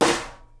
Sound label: SNARE, Live Snares